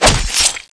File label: knife stab